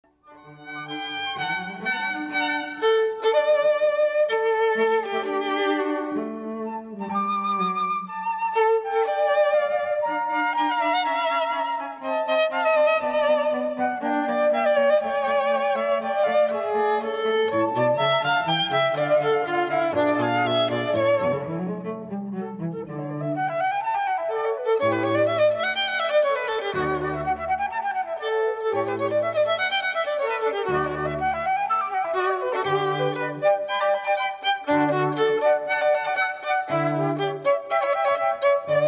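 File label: Trio Spiritoso (Flute Trio); Haydn London; Cantilena South Florida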